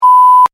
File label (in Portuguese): Som De Bip; BALI OTICAS